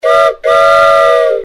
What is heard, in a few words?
train whistle big